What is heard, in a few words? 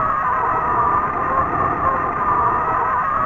skidding